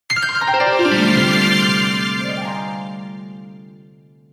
Harp Strings

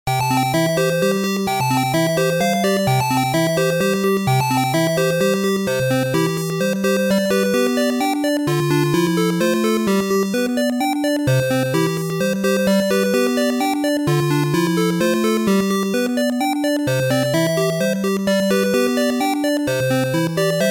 Brush Roller

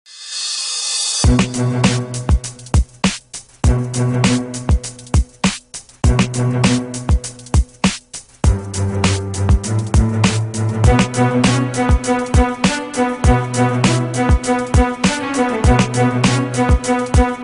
spiccato violins

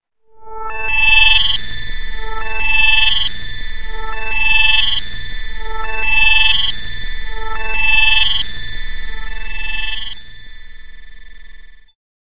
High Voltage

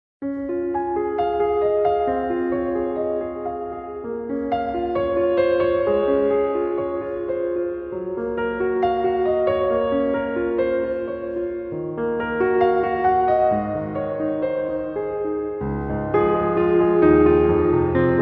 Piano solo

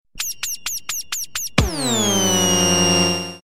New Awesome Sms Tone

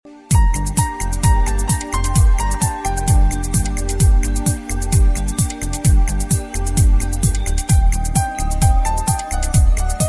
Power Pulse

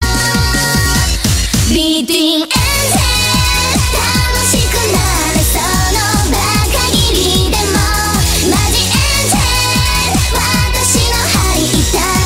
beat

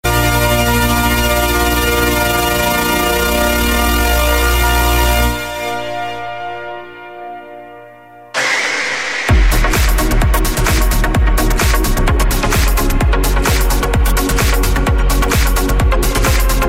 trance

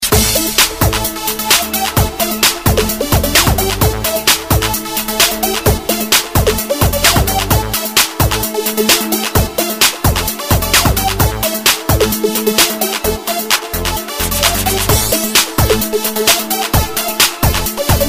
Teckno

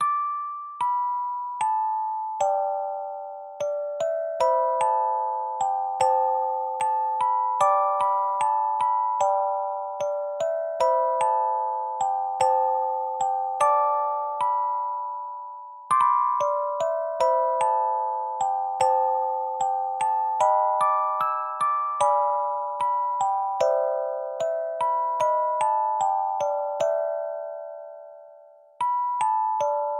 music box; Wangxian

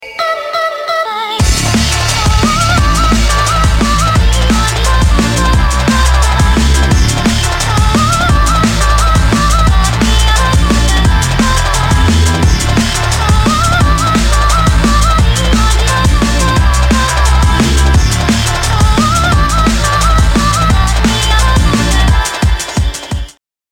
Complex Dnb

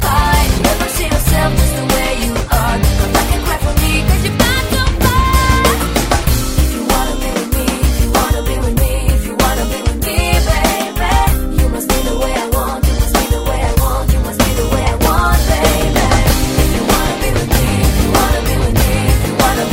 Ringtone Cool

